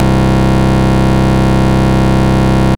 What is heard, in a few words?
Bass Note C